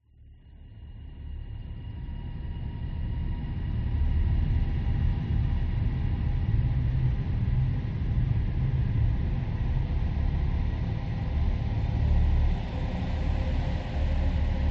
Ambiance

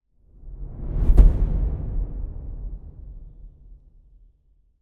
sound design hit cinematic hard hitting

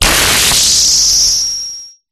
Glitch Decimated; Glitch Factory 01; sound spark

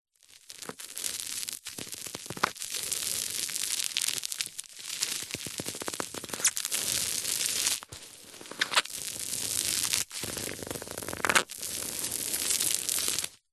office sticky tape stick rub squeak to carpet

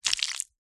cartoon wet squelch sloppy movement

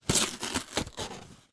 cardboard sheet rip; foley

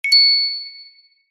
magic wand ping; zapsplat

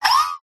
science fiction robot movement short burst

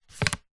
household book minature set down table; zapsplat

